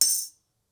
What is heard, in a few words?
Beat Ring, drums singles; The Roots Drumloops